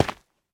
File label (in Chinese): 玄武岩踩踏声